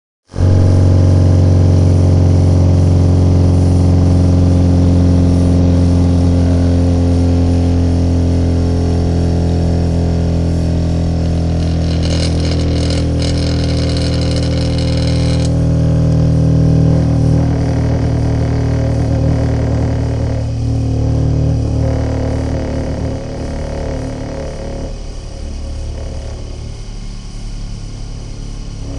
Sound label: Grinding Wheel Grind, grinders